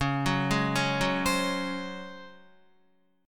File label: Db Major 7th Suspended 4th Db down Guitar; arpeggiated; JGuitar